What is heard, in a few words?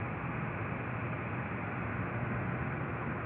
outdoor